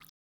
running water, river sounds

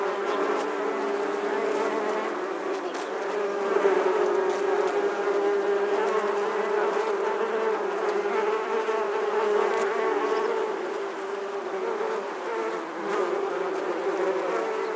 bees, ambient

